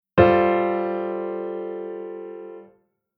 Chord Compound

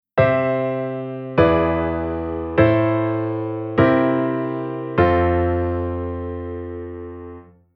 Chord Sec Dominant Pattern

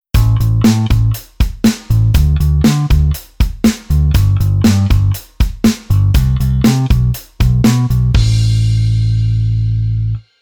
Bass Arr Oct

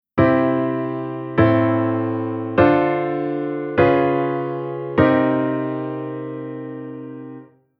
Chord Modulation SubV7

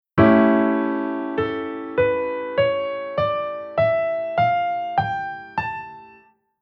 Chord Chord Scale Sec Dominant

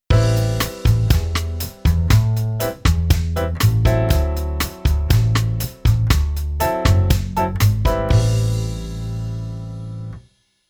Keyboard Pf Bossa

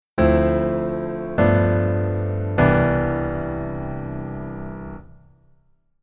keyboard voicing low

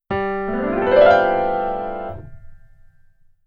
Keyboard Gliss

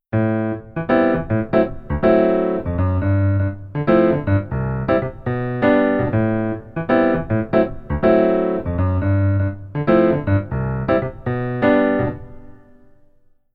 Keyboard

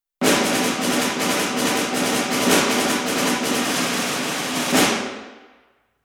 SD accent

